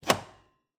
Cabin, outer doors, double doors, tension, latches, wooden, screens, slide bolt, hyper; Eiravaein Works; Vaeyan IV